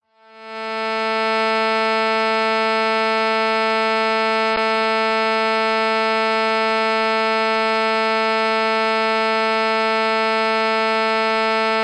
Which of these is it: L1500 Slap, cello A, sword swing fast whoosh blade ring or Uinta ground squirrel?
cello A